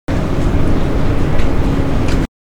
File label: Background Noise